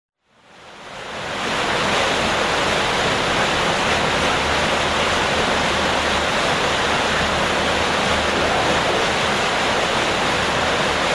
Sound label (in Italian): acqua water, natura